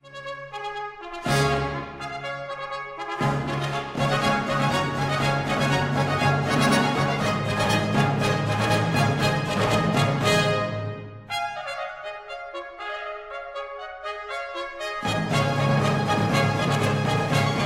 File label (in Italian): or la tromba in suon